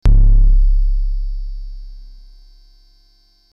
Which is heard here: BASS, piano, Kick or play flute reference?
BASS